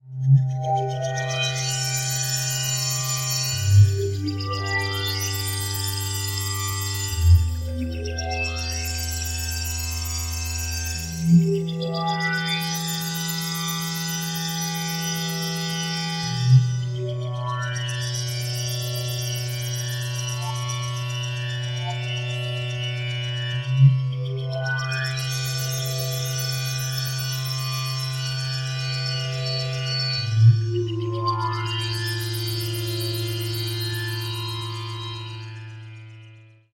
tune

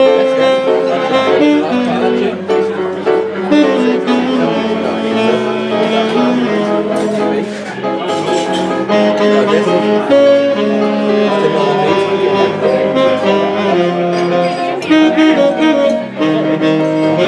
live jazz in soho

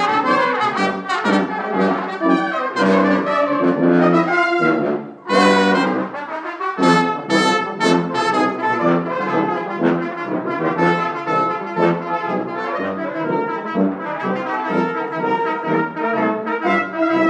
drunk brass band on lancaster railway station; this is what i like about the north

